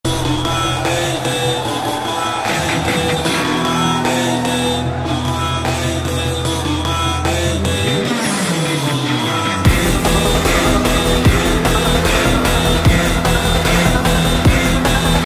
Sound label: trance solo; tomorrowland